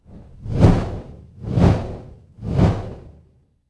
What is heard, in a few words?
fire electric whoosh flame